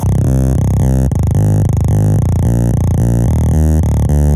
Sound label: Low Coder 110 C; Leeds Algorave 2016